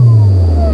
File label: Laser discharge; Barrack Unity